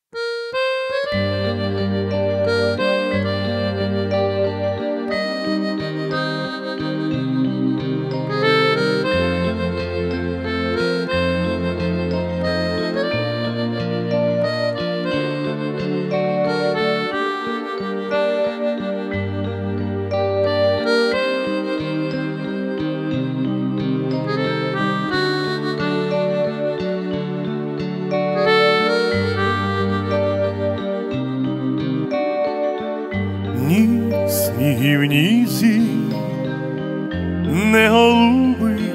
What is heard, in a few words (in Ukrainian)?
cover наживо ketron audya5 ketron event yamaha modx7